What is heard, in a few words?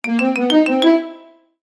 cartoon ascend climb sneaky orchestral